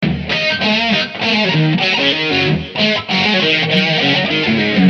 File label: Eb2 solo